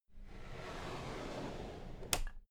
drawer cabinet equipment open soft

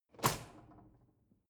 drawer cutlery close draw short soft

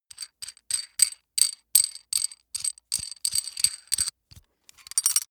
metal screw nut sequence long

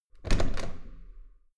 metal door heavy handle use hard

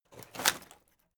wood break

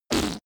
whoopee cushion fart

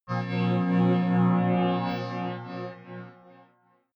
sci-fi galaxy rising ring

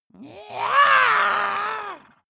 monster scream high

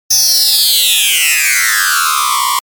sweep

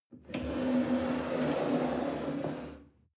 chair wood slide on floor soft

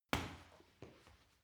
punching bag kick soft

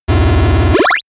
arcade explosion

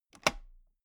toolbox latch open loose

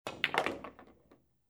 billiard ball shot

